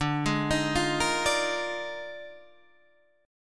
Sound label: D sharp Major 7th Suspended 2nd Suspended 4th, D, guitar chord